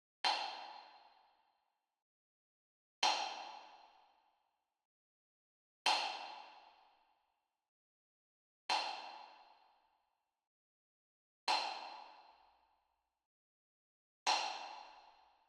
Wood Percussion Wood Block Traditional Small Hit Far; boom library; Cinematic Strikes Construction Kit